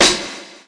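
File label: classic hip hop snare; Classic Hip Hop Mega Kit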